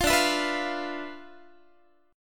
D Minor 6th Add 9th D, Guitar; Dm6add9 strummed; 20,22,19,21,21,x

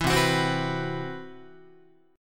C Major 7th Flat 5th down Guitar, chord; CM7b5 strummed; JGuitar

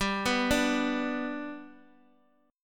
G Major down Guitar Open D

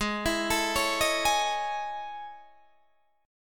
A Major Sharp 11th, Ab

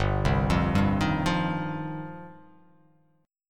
G 7th Suspended 4th Sharp 5th

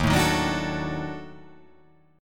D sharp Minor Major 7th Flat 5th

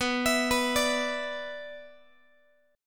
B-Minor Double Flat 5th, Guitar; Bmbb5 arpeggiated; JGuitar

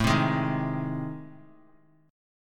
A Minor Major 7th Flat 5th, Guitar; strummed; AmM7b5; JGuitar

